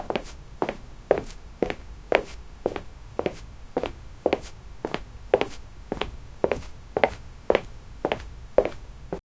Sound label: foot step, far